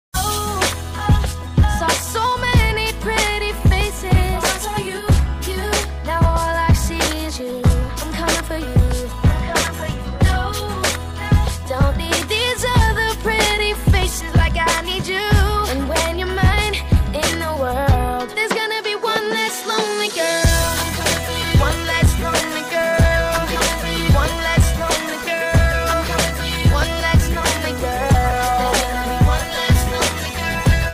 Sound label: ringtone